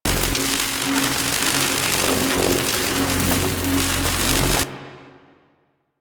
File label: Gas Electricity Loop; Warframe; Corpus Gas City